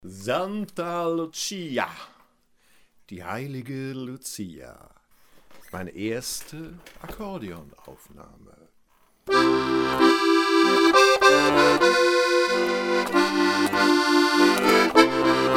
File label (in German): santa lucia akkordeon